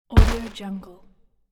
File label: impact fall